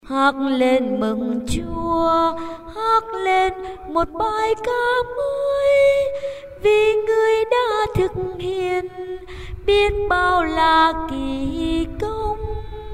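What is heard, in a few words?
soprano tenor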